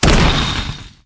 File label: dark fire